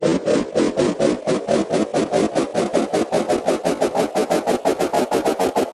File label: breakdown; daryan